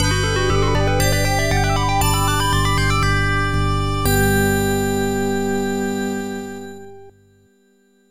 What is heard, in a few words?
Logo (MS-DOS Version - Adlib); Westwood